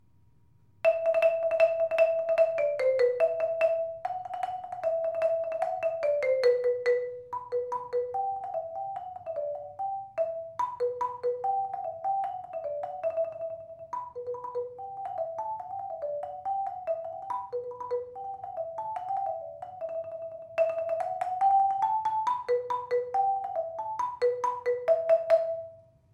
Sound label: perc ens cycle keyboard